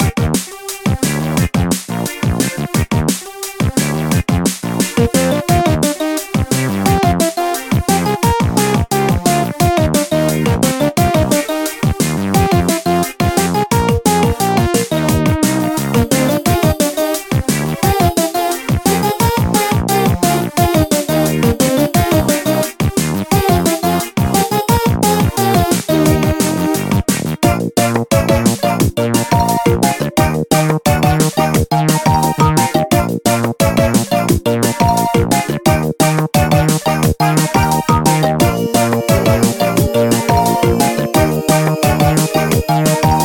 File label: Bgm Main; Cookie Run